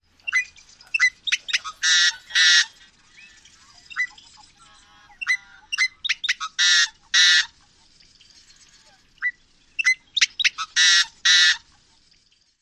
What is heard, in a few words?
songs